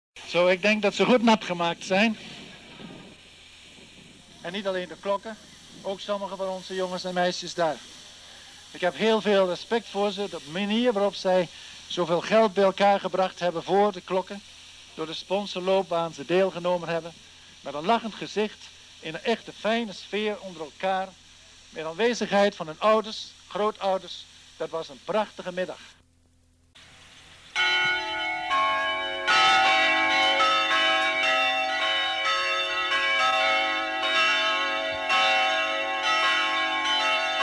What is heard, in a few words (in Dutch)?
Klokken Willibrorduskerk Geerdijk geluid